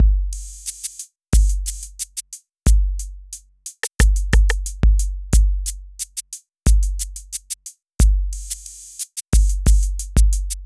Beat, Hip-Hop

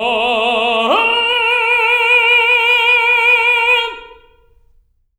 TENOR OCT A#3

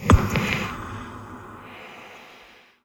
noids

